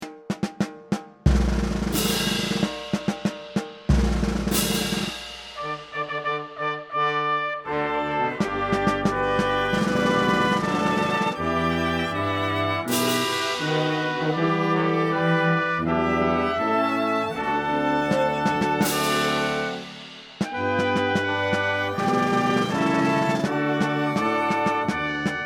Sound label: Star Spangled Instrumental; thunderrushthemovie